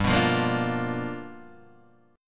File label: G 7th Sharp 9th G, Guitar DADGAD; down